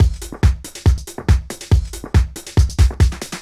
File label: Kick Loop, 140bpm